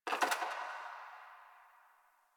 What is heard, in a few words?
Ice Crack One Off; Warframe; Ambience; Nineteen Ninety Nine; DE